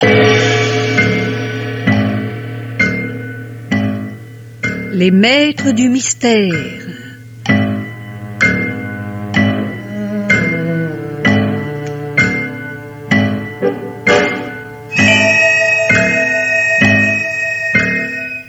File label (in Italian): tempo di suspense; openings